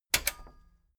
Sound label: metal button stereo click disable heavy